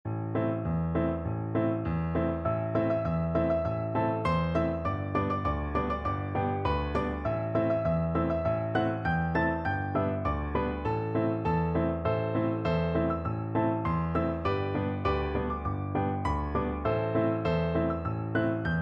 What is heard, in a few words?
drunken sailor, piano duet